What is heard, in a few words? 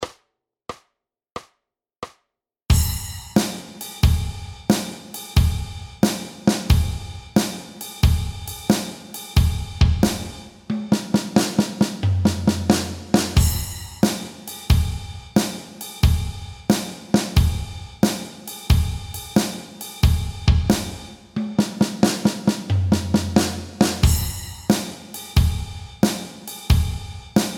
swing Drum Warm Up; Intermediate Drum Lesson